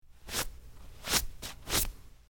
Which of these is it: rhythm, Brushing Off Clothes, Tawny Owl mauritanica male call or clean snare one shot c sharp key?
Brushing Off Clothes